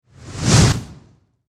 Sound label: Horror Magic Spell Whoosh Impact